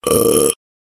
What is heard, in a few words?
Belch